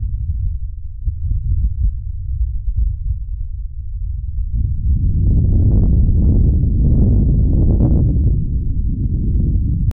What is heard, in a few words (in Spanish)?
trueno retumbando a lo le, distant